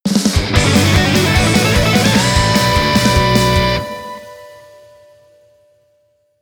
Stage Clear; Stage Clear (Zero); met megaman x revision

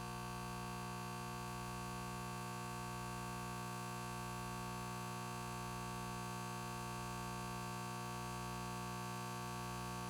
light buzz; teardown ps5 windows xbox series xs gamerip 2022

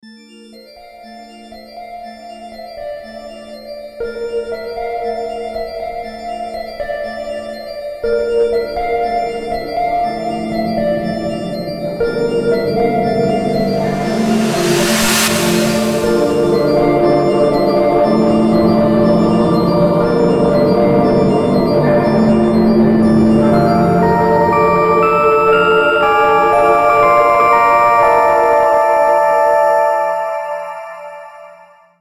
Spaceship Arrives; starstruck hands of time

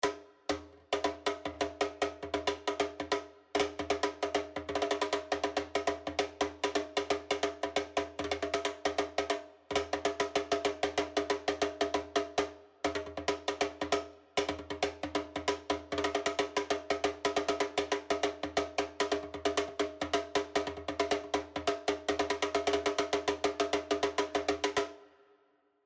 Hunting High Solo; Horizon Zero Dawn

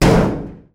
metal hit

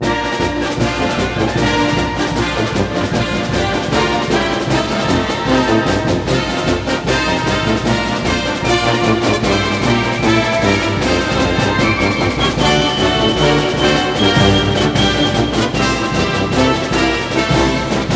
Broadcast Fight Song

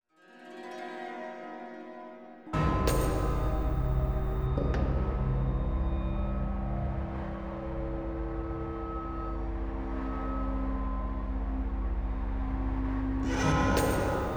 Horror Glitch; the matrix path of neo; The Killing Blow

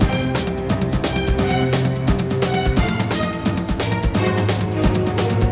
music skill shot; Castle Storm

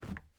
plastic jump

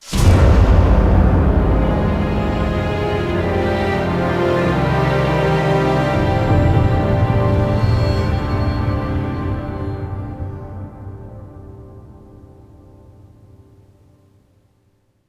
fail

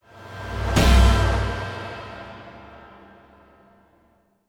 End Stinger

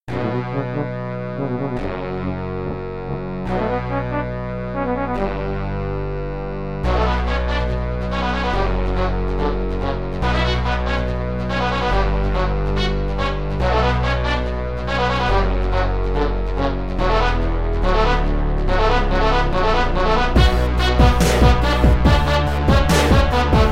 Brass; Suits: Absolute Jazz; Brass GamerFuel

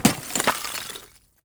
ice break; teardown; ps5 windows xbox series xs

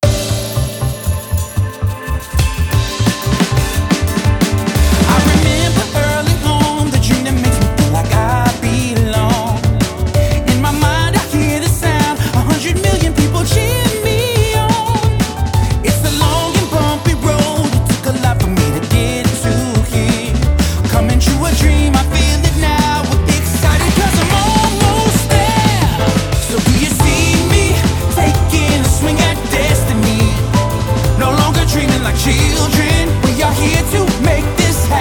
Music Menu event

